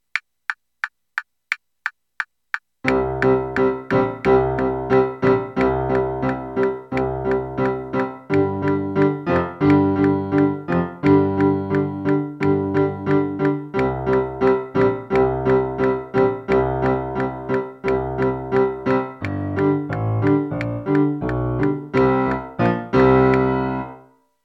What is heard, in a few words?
secondo